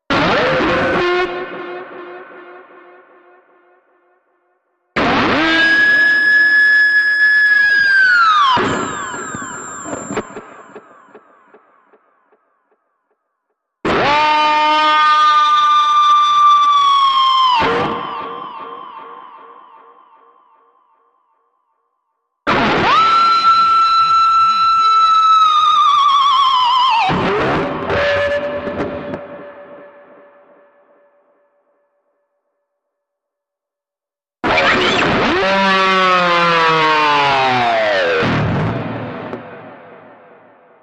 Guitar Screaming

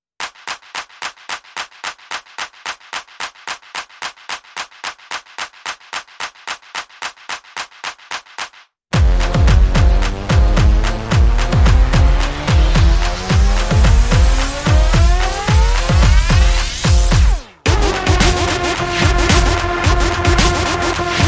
No Vocal

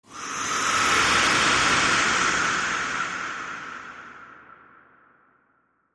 Transition Breeze Swell

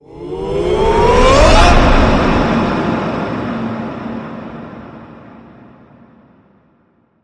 Victory Whoop Transition

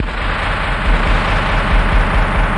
Glitch Tremolo

